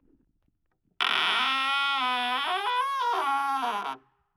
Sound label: squeek